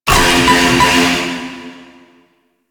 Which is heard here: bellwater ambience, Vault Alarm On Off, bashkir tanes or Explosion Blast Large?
Vault Alarm On Off